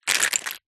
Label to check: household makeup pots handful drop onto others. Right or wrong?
Right